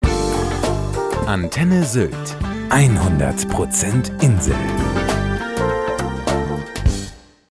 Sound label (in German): Jingle; Antenne Sylt